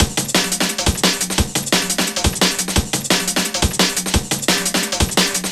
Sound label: Party Beats, breakcore breaks